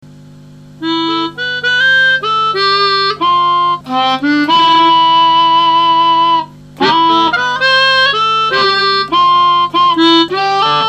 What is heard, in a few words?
harmonica